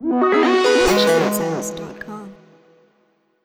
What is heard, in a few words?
Arcade Follower